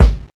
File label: studio kickdrum sound g sharp key; kick drum samples